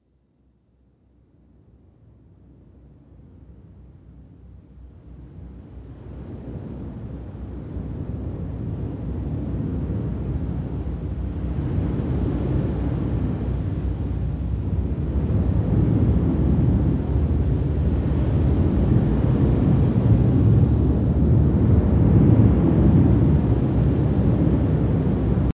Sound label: Harmonic Coherence